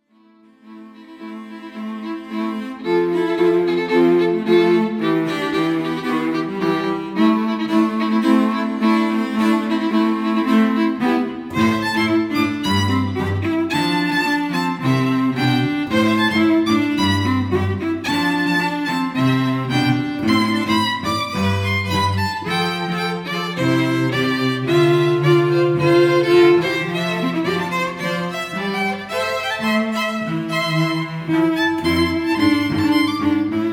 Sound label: String Trio